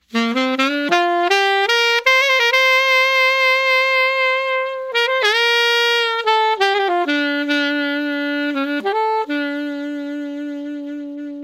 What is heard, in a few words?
alto